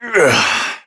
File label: Struggle Short, Adult; Warframe; Duviri; Drifter; Efforts